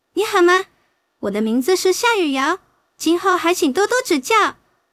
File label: deep sample